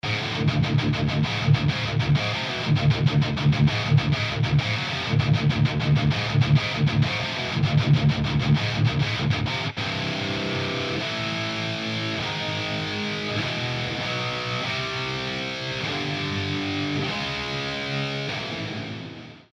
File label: 4 GITY SINAMP